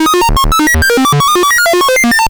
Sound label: thingamagoop